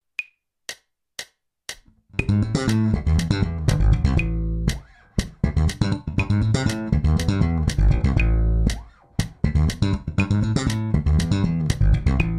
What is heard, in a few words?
Doublethumb exercise Am